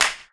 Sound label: Clap n Snap, Snares